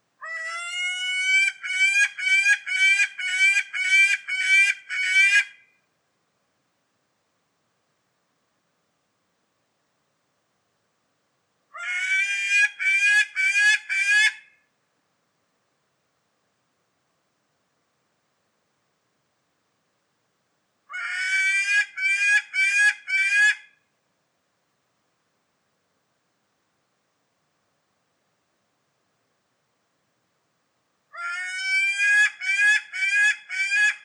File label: Gyrfalcon